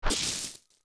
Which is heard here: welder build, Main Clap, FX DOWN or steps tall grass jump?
steps tall grass jump